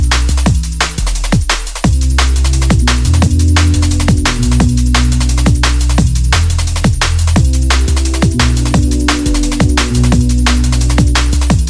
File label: jazz